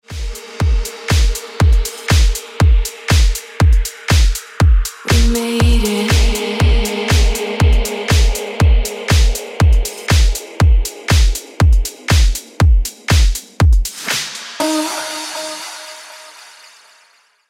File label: Ringtone Deep House Music